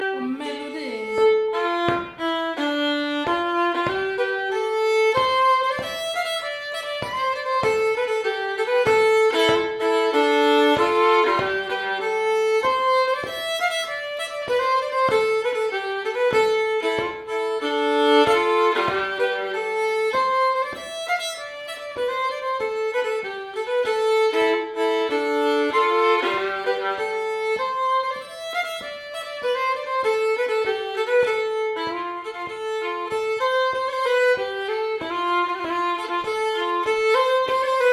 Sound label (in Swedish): Slängpolska; efter Karl Kollberg